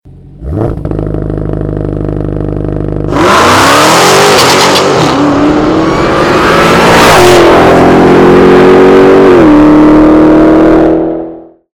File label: challenger srt demon ringtone